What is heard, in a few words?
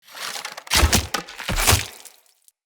Hunter Bow Ranged Finisher Front; Weapons; Player; Bows; EnvoyHunterBowRangedMelee